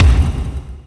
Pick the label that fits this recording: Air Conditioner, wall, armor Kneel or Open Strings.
wall